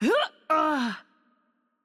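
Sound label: Hurt Heavy, Czech; bloom minigame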